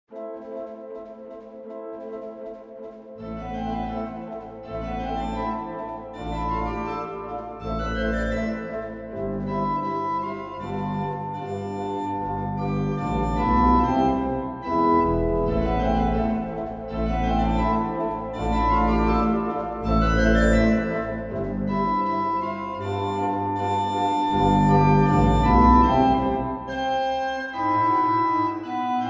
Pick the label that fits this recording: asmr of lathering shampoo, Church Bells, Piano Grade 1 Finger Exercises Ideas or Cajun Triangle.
Piano Grade 1 Finger Exercises Ideas